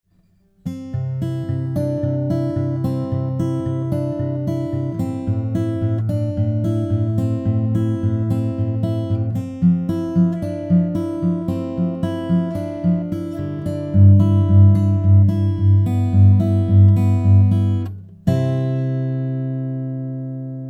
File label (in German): Sonic Farm Creamer SE Test Akustikgitarre